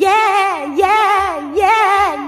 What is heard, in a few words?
yeah yeah yeah sung; ooh ahh; voice